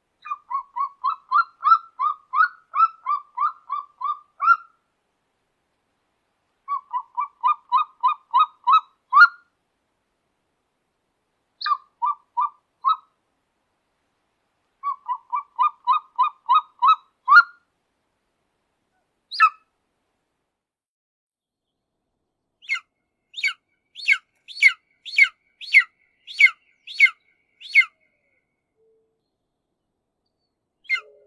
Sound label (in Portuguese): canto da aguia negra africana